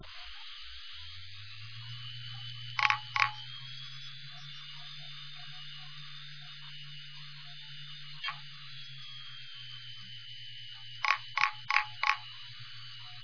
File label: quantum, hdd noise